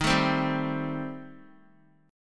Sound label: Eb 6th Flat 5th Eb down Guitar; Eb6b5 strummed; JGuitar